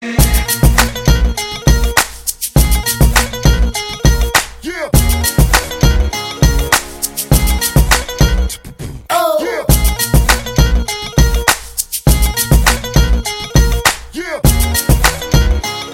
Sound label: Punjabi Music